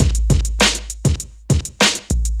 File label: Dance Mega Hip Hop Electro; EastWest